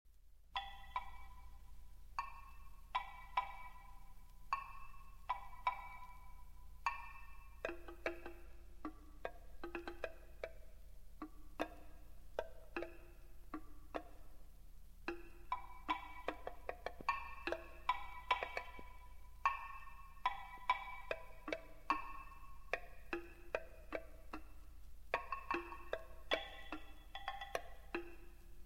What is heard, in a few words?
Prepared Piano and Percussion Trio (Seven Wood Blocks, Not Chinese); Amores